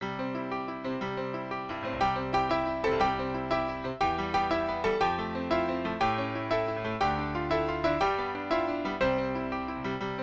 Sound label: ring around the rosy classical piano